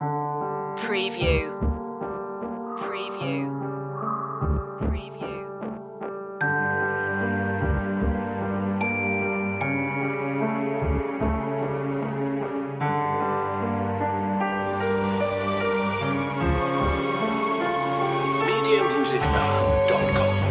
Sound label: no perc